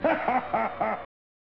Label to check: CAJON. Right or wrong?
Wrong